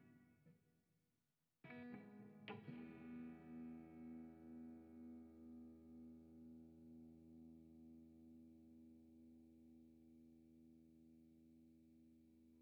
guitar fx; skate

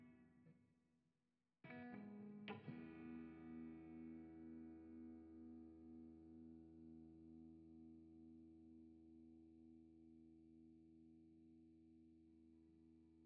guitar fx; skate

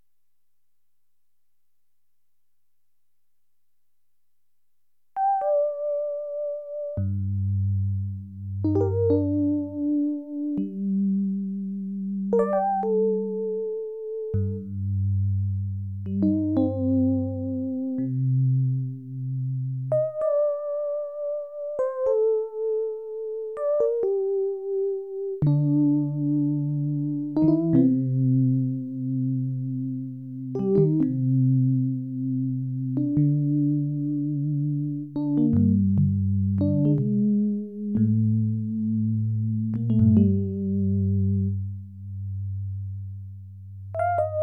DX7; skate

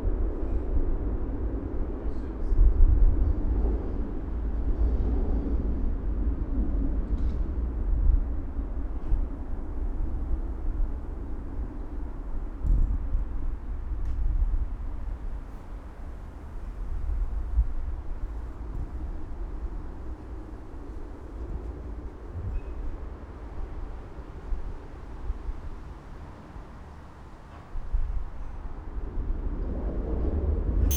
field recording; radio 101

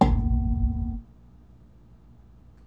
reamp plink; radio 101

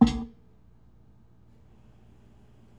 reamp plink; radio 101

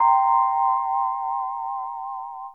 rhodes Bb Maj; porkys adventure

